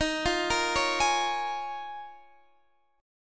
D sharp 7th Suspended 2nd Suspended 4th, Guitar, Open D